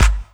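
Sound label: snare drum bass drum, Dance Mega Drums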